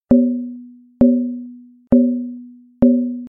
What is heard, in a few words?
alien alarm drum